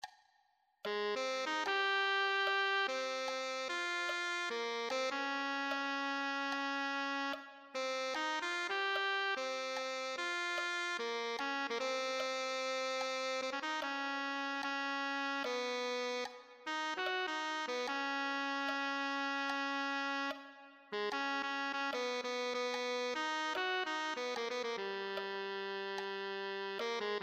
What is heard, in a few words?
tenor saxophone